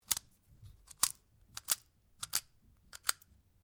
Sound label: RIFLE CZ 452 VARMINT 22 CALIBRE MAGAZINE UNLOAD; A selection of domesttic firearms; Rifles